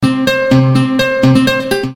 banjo sms; ringtones

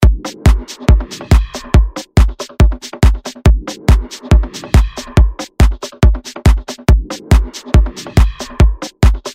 techno

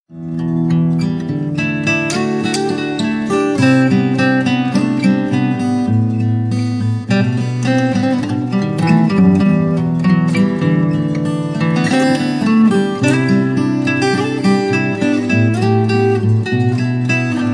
mellow guitar

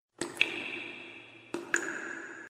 SMS tone

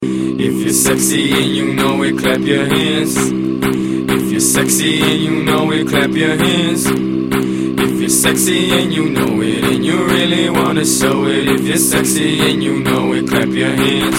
clap hands

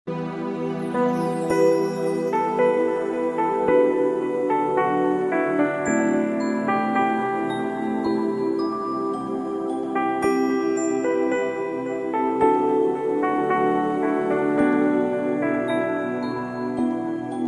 INSTR

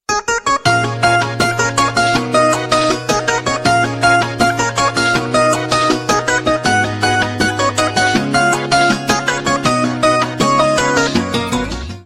ringtone

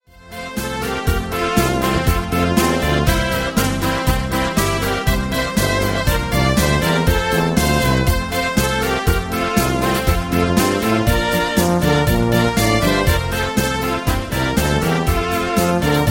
Fantastic Polka Ring

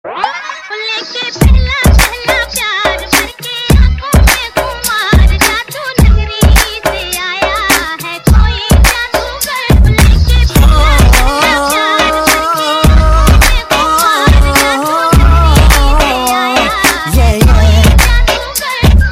dj hindi, hip hop ringtone

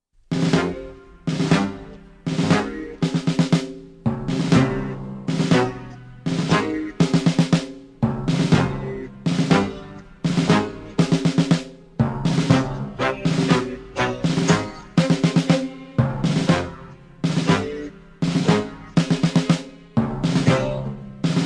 fight; ragon ball z

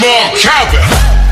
More Cowbell

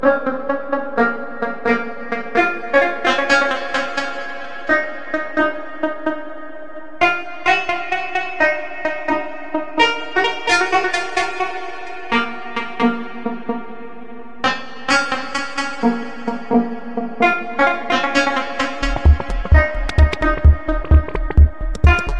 Cool Ringtone Dj mix

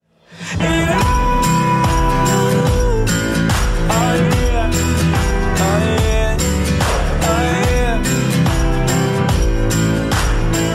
acoustic

